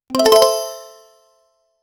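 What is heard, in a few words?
bonus scatter, slot machine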